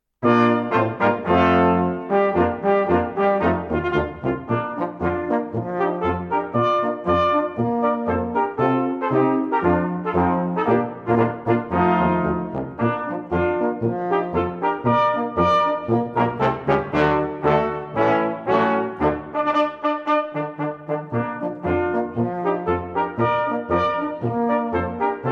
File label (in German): Balkan Marsch